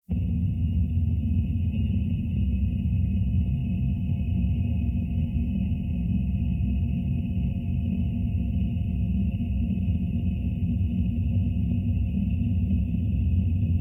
noise distortion; Noise HiFi; Red Distortion

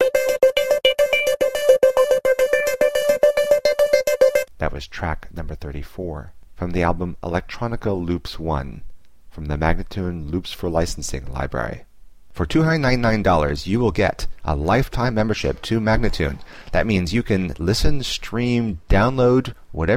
107 D spoken; Electronica Loops 1

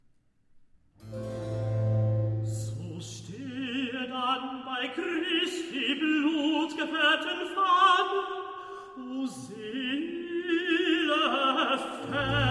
Recitative; So stehe denn bei Christi blutgefarbten Fahne; Bach

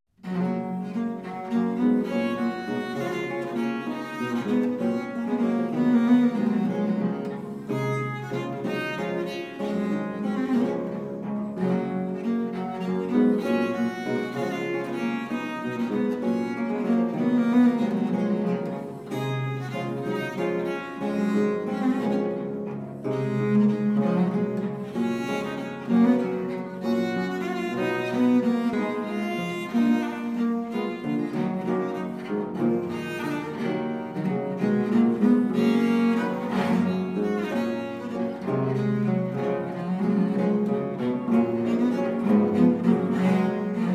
Gigue; Marin Marais

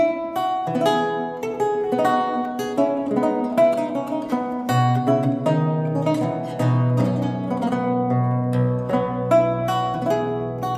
Courante; Antoine Francisque; Orphee

